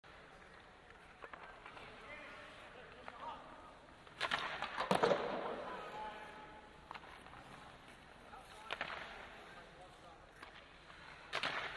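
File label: Hockey Players Practice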